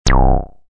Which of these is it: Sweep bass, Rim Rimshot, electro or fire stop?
Sweep bass